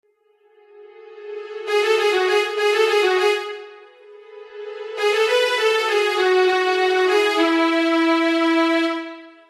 reverse reverb

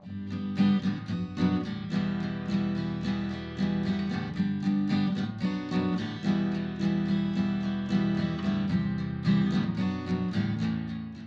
acoustic test; acoustic guitar

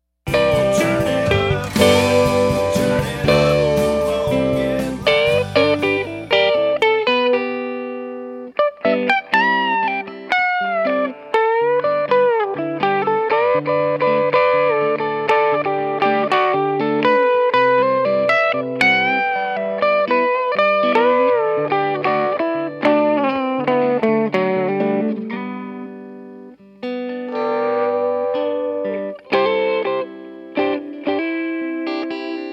solo out guitars

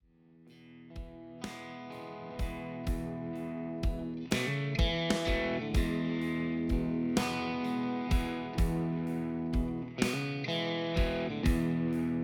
strat in E

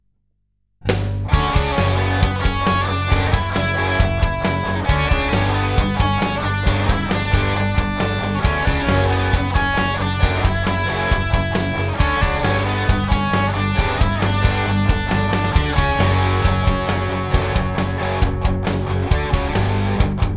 d key test

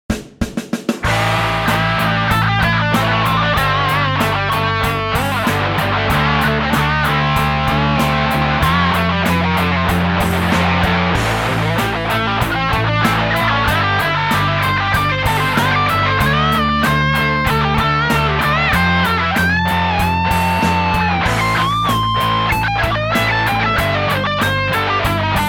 emulated 80s

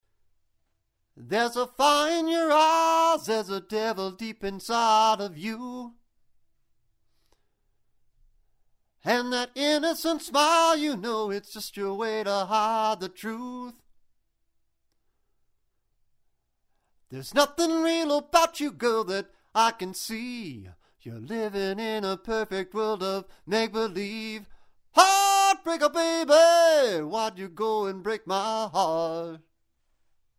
hb sputnik sample no pre; mic pre amp compressor

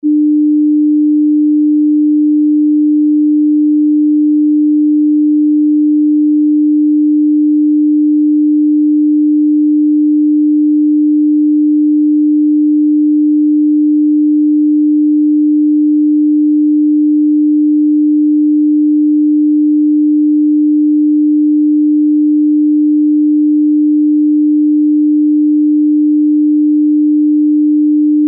tone 300hz

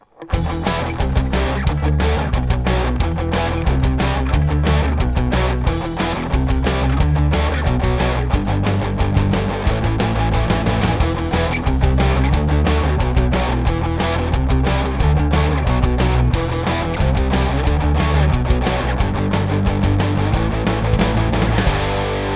tone test

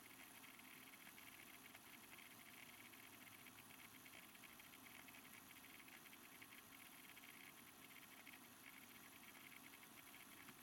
room noise; usb mic

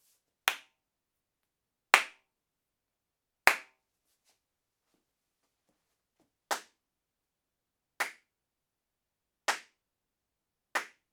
weird sound on my rec room

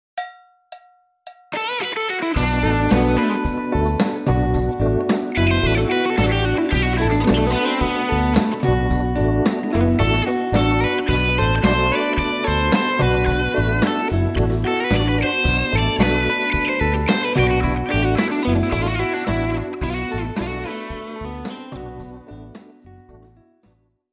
phrygian; guitar; scales and theory

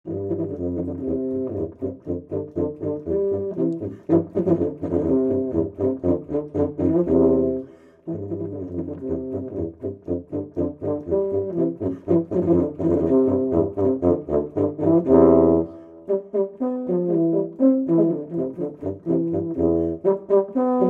allegro, classical tuba duet

